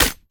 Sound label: vs flesh; LoL; masteryi